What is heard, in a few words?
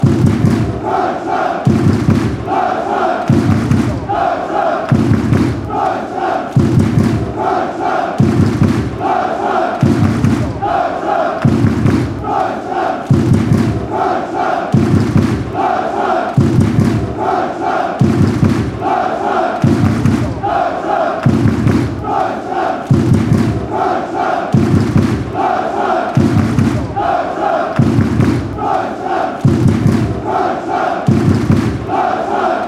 deutschland clap, fan chants